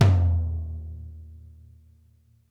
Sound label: Interactive Drum Kits L; Sonic Reality; 90s sample cds